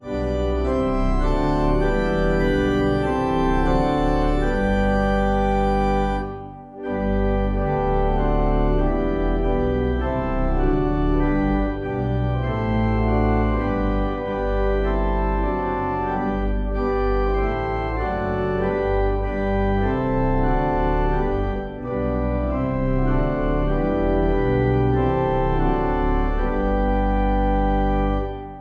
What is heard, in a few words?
organ; the eighth tune; Hymnal 1982